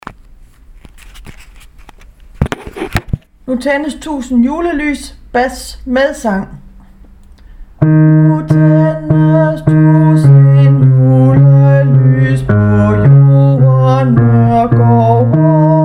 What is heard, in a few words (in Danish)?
bas; nu tændes